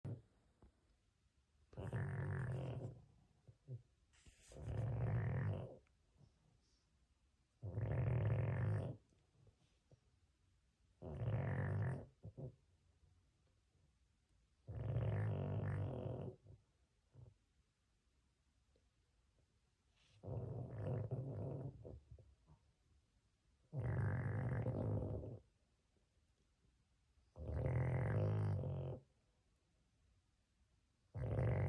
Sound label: snor; Bellevuepark Griffons